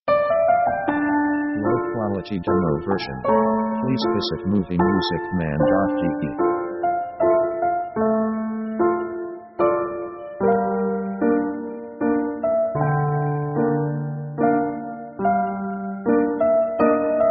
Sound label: piano 80bpm minor walz; sad themes